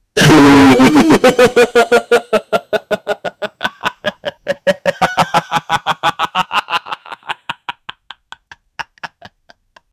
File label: laughing, amusement